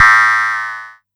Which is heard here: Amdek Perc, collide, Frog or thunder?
Amdek Perc